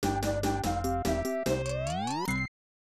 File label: Unused Cinematic Fanfare; Tetris 2; SNES